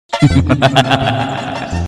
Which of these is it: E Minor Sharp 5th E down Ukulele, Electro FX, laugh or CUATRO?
laugh